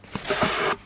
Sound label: book pul